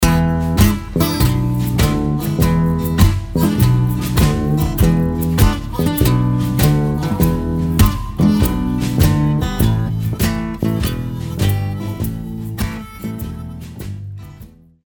acoustic blues

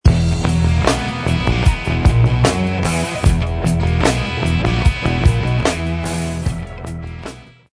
lydian funk

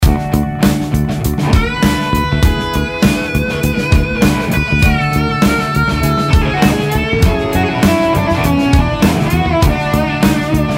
D Lydian Derivative

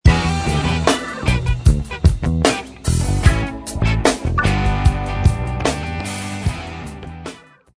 dorian funk

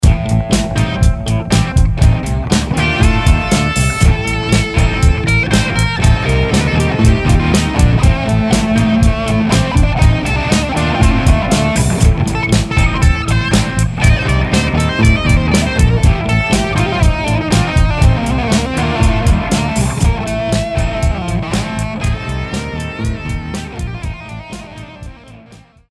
A Locrian Parallel

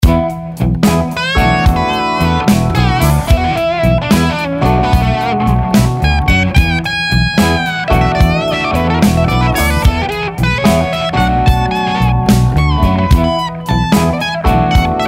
E Mixolydian Derivative

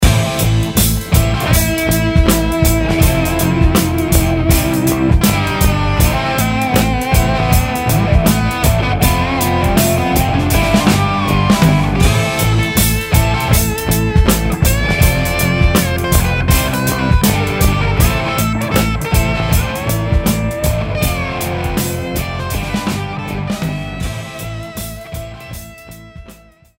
A Aeolian Parallel

